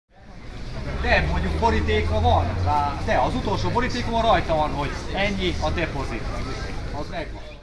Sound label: italian market in bedford